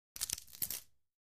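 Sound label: Athletic Tape Stretch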